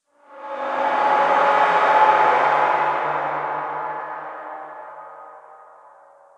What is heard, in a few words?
Amb Canalisation Amb One Shot; Puddle; puddle plus ios gamerip 2018